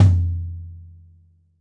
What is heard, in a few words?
bop kick - snares on; JazzFunkKit